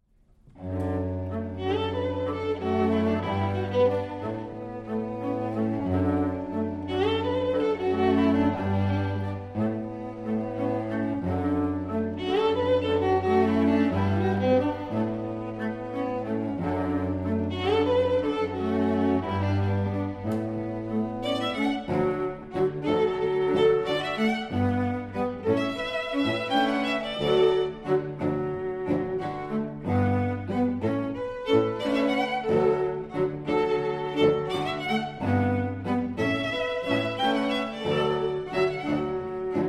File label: strings